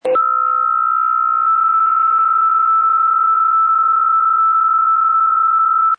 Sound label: psk63